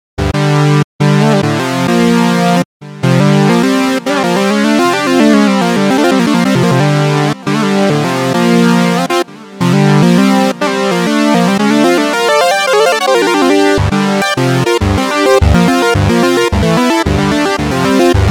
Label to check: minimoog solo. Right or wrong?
Right